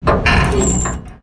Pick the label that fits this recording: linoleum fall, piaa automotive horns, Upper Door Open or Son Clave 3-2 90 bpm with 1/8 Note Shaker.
Upper Door Open